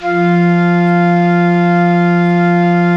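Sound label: Tone F4, church organ; Best Service; Advanced Media Trax Modern Composer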